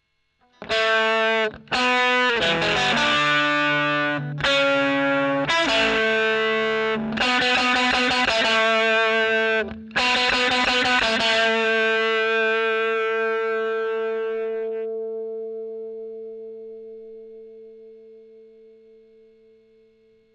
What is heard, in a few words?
E.GTR OD; Gefell